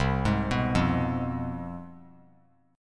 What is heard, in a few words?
C Major 7th Suspended 4th Sharp 5th, down; CM7sus4#5 arpeggiated; JGuitar